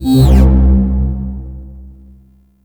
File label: UI Starchart Planet Deselect; Warframe